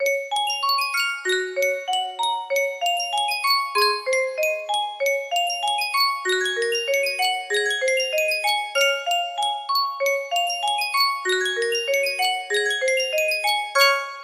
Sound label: Yunsheng music box, music box melody